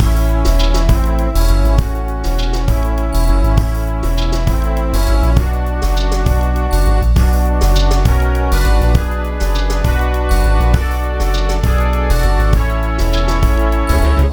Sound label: CIRCUS